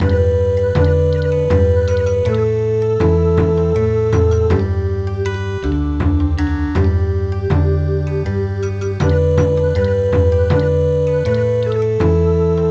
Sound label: Bon Odori; My dear mothers child